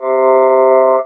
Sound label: talk box oo